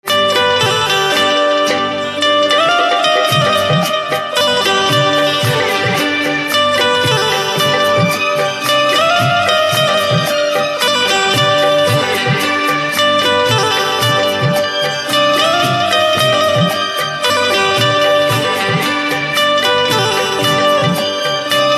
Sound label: Banjo, instrumental ringtones